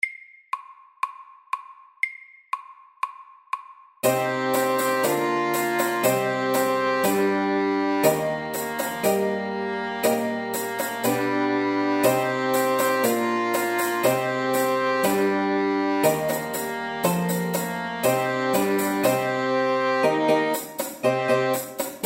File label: Old English dance FAST 120